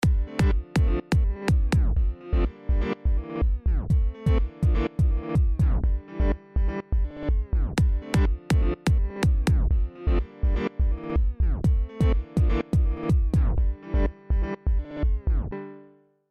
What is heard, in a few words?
Piano Chain